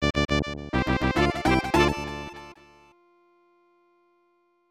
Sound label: Level Up!; benkei gaiden; snes